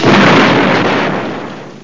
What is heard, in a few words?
Explosion!